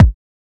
old school subtle reverb bass drum single hit a sharp key; Duration 0:01; Samplerate 44100 Hz; Channels Mono